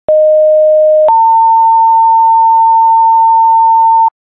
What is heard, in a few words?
siren; clinton